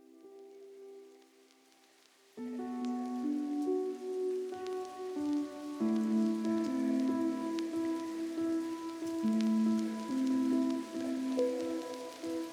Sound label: lofi remix